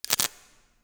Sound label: substation oneshot; Counter Strike Model Pack; CS:GO; nuke